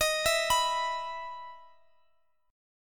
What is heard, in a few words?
E 5th Eb; E5/Eb arpeggiated; JGuitar